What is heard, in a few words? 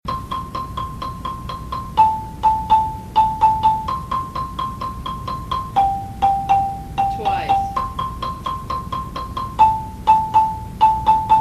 lead high signal, marimba